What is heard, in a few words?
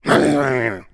Weird Grunt